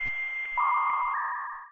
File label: enemy spots; AOL